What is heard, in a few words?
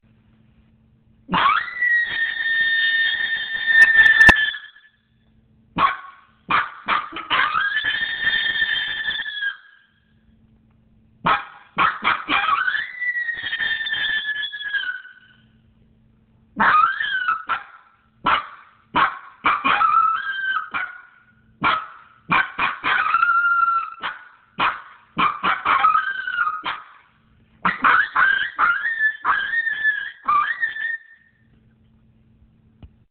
Howler; Loess Hills